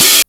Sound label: DMX OH